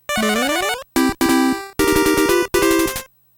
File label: Success